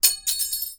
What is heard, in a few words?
Fall Spoon